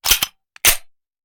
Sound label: Hand Gun Foley; Sound Ideas; Guns